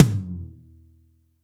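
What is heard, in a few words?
TOM loud; Zero-G; Chemical Beats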